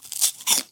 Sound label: Bite